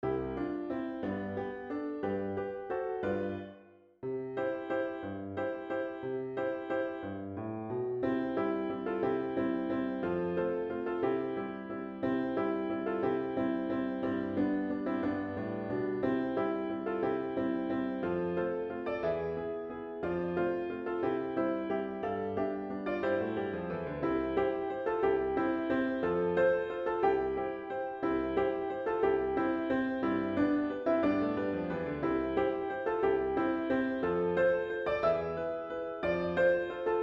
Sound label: waltz